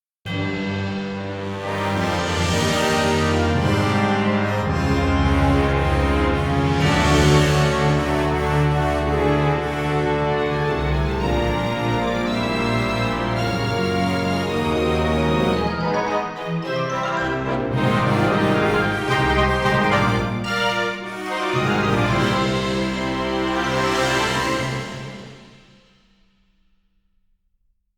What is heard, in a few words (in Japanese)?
ファンファーレ